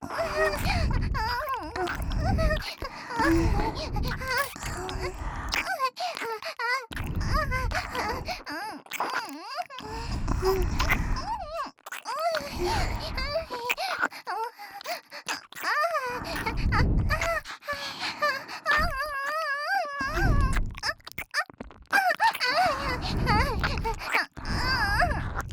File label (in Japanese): 両耳キス+可愛い喘ぎ声 SE無; 3WAYループ; オナサポ